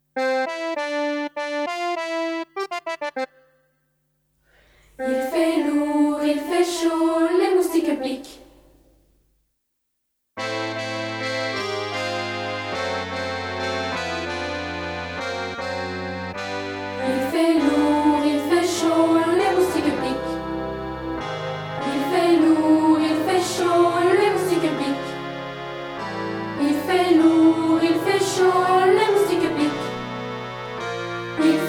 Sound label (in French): Les Moustiques; orphee